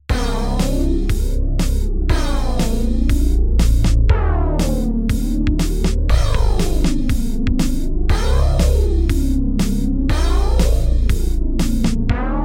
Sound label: Oscillator BASS Trembling Glide; Alphatron